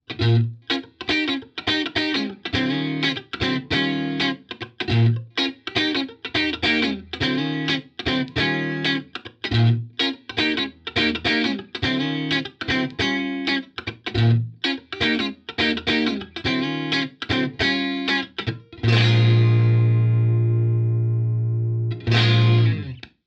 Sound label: st white m clean center